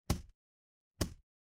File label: retro game hit